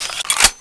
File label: tau clip in